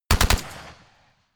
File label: rifle ak-47 exterior fire burst